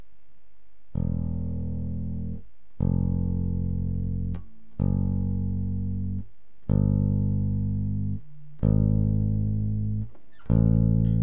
FINGMED, BASSGUIT FINGER

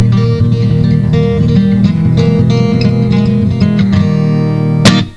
FLAMENCO

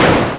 COLLIS

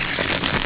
BATS, ANIMALS

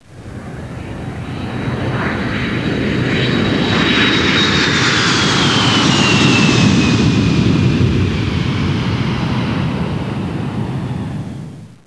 JET

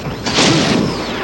PERC

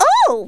ewwww

